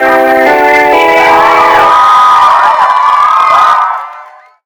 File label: Se Goal; Extreme Bike X